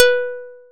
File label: plucked, programmable speaker